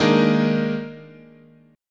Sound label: Eb Major 7th Suspended 4th, chord